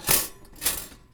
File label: Toaster; BB Retail Therapy Sample Pack